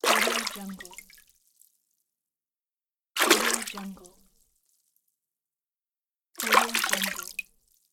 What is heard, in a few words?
the water splash sound